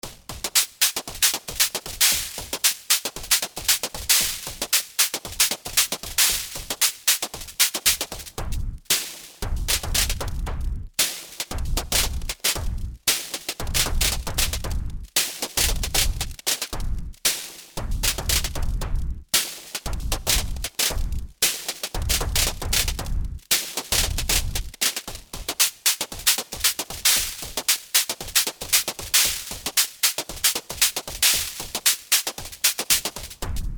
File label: drum kit noisecoder